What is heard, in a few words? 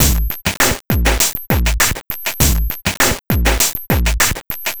Noise Beat; Drums & Drones